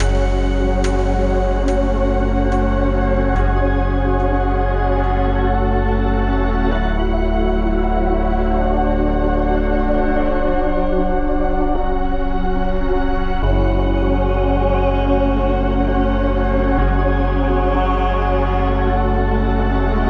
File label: 143 BPM F# Min, Melody Loops Drill; Lock Off